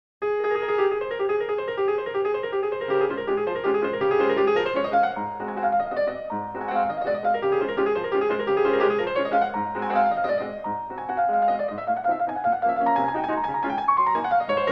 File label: Waltzes, Molto Vivace in D-Flat Major; Op. 64 No. 1; Minute Waltz